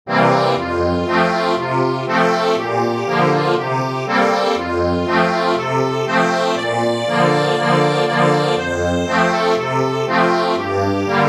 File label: accordion